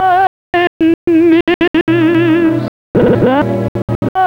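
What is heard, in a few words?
rhythm loops, 112 bpm; Rarefaction - Poke in The Ear With A Sharp Stick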